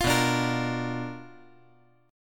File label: B Minor Major 7th Flat 5th B, chord